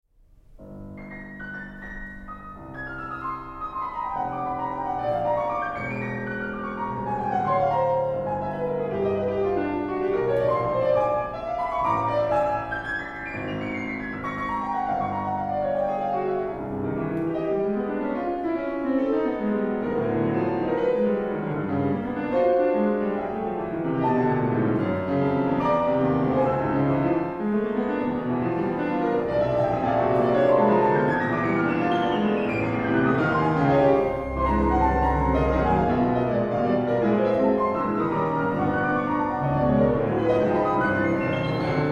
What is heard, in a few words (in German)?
Sonate